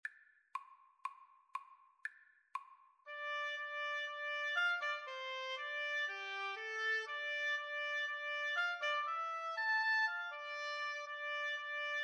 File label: Oboe Duet; Dvořák, Antonín - Suite Op. 98, No. V, opening